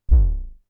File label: bd hip hard, Polivoks